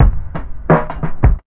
DRMSND, Pure Electro, BEATKIT; USB Soundscan vol.03; AKAI

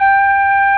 SHIAO G3

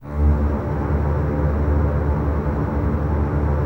String Ensembles, Basses, Tremolo, D; Miroslav Vitous; 90 s Sample CDs

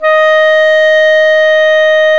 Clarinets

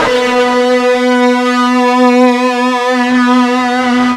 FUZZ B 2, ULTRA FUZZ, Guitar; East West; Steve Stevens Guitar Samples Collection

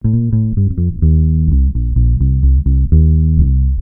REGGAE C, Bass Grooves; USB Soundscan vol.30; AKAI

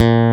EL-GG A#1, Bass Guitar; AKAI S6000 CD-ROM - Volume 1

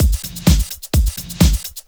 Clubhouse Loop 128 BPM

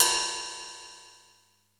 DRY CY RD; Crypton; DrumBase5000

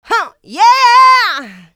Vocals, Adlibs; Houseworx

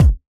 BD; Best Service; Dance Mega Drums

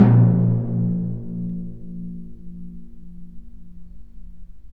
DRM, Orchestral Perc; Roland LCDP03

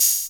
HH HH, Dance

